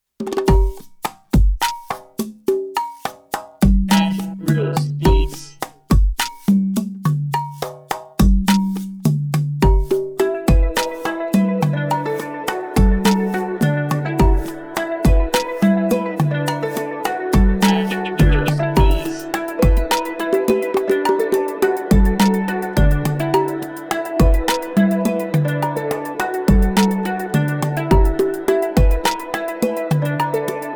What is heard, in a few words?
AFRO CUBAN BEAT 105bpm